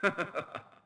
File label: Intro Laught, Restaurant; Levels Hub Restaurant; Intro Laughter Adult